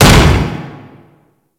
Contained Glass Hit

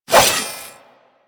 Nikana Swing D; Revenant

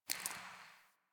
Int Water Drip; Ambience; Interior; Warframe; Orokin Derelict

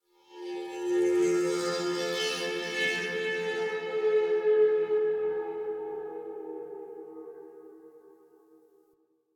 Texture Interior Medium, Ambience; Sentient Lair; Warframe

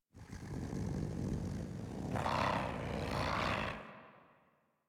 Kavat Vocal Idle; Warframe; Khora

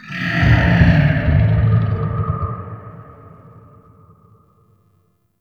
Mystical Tree Creak, Wetland Forest Day; Ambience; Midrath

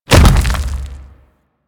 Golem Step Default; Warframe; Footsteps

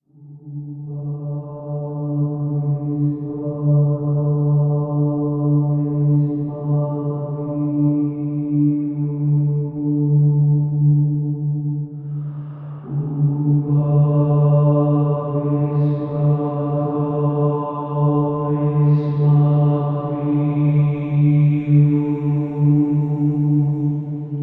Hub Amb Cue, Music

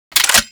Four Barrel Rifle Mech Load

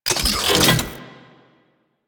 Blast Unequip; Warframe

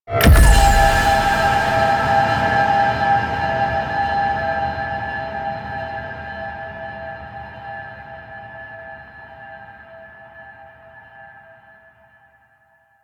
Distress Signal; Orokin; Warframe; Quests; Wraith Quest

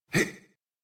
Pain, en; Warframe; New War; Teshin

